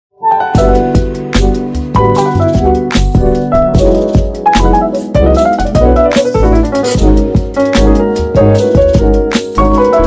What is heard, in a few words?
beats improv